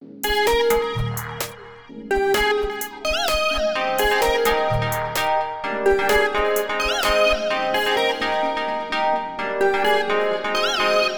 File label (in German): Kick; Sommer